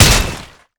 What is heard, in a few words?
metal gloves hit flesh; tf2classic